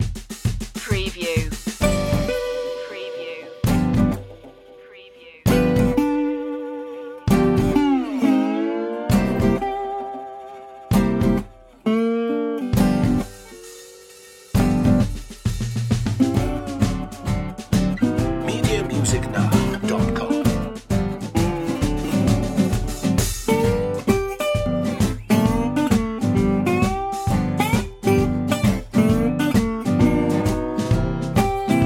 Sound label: blues